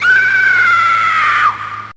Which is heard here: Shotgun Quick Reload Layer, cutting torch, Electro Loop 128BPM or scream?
scream